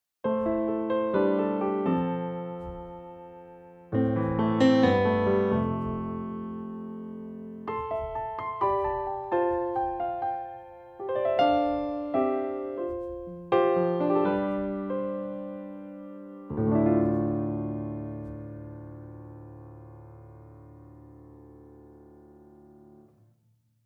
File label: Kontakt7 Piano Noire